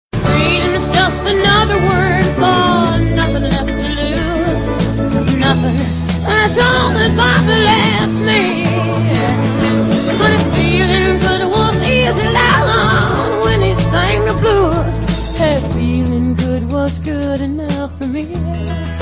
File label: Meter ring tone